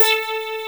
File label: DG WAVE, DIGI SYN